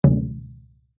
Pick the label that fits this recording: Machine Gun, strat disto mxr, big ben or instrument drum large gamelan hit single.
instrument drum large gamelan hit single